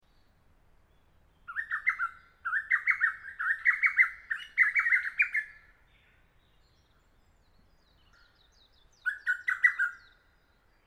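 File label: hototogisu, Lesser Cuckoo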